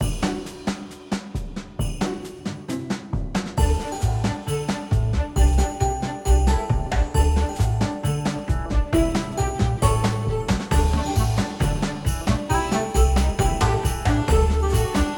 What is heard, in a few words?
Music Free Spins Loop; holly Jolly Penguins; Mahi Gaming